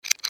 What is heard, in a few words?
Rollover Short Rattle; Mouseovers; Multimedia; Blastwave